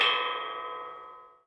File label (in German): metallklang; klang im schnee